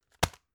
tissue package drop hard

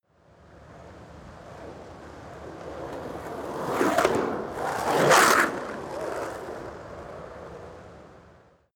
skateboard double drive by scratch

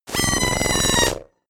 screaming synth sequence short

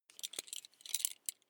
metal belt buckle clatter soft

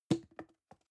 plastic can small fall bounce soft

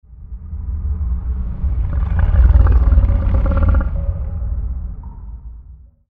growl

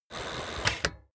bicycle street brake hard

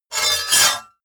sword cut hard scrape

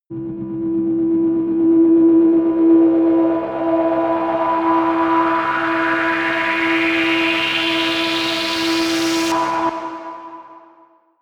rise hum whir medium

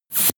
air pressure release

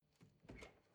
refrigerator door open squeak

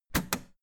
old button harsh ringing push hard

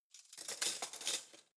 cutlery spoons drawer place soft

